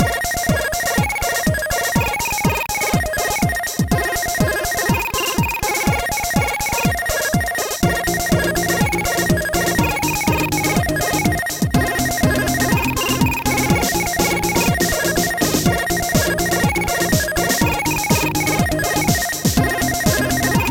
oriental mix; SoundFX 1.3

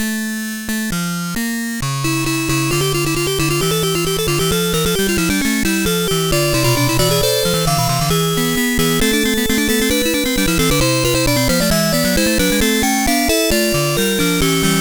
requiem; The Music Studio

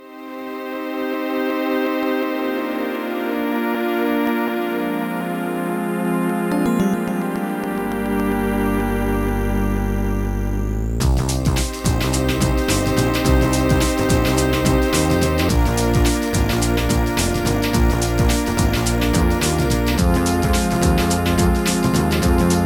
swing beat; Trackers; Modland

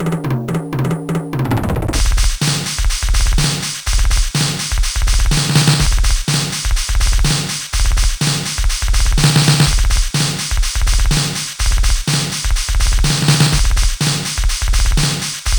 disco beat stereo-version; Sonix Music Driver

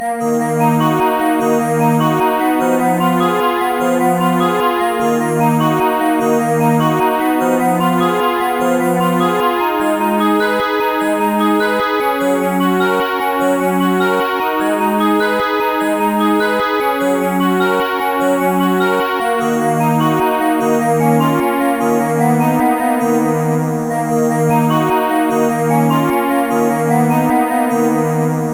switpiano; Trackers; Modland; switpiano v2

